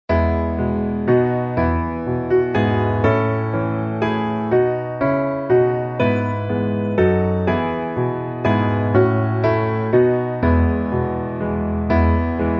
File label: piano